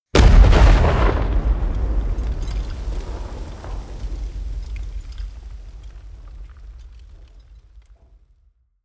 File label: detonation